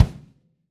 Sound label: kick dumping